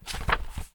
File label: U I Passport